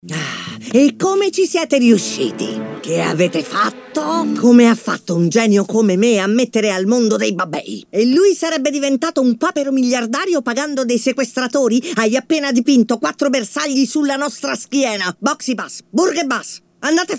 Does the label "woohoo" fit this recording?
No